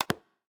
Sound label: lock; LG G7; square lock